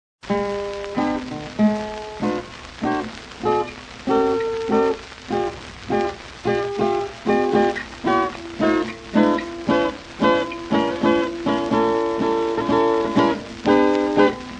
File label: Guitar Medley